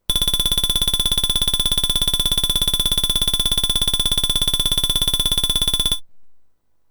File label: Release rate increase!; lemmings atari st 1990